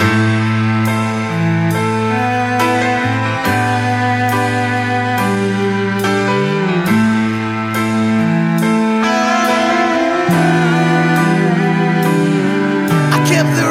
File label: piano, sad loops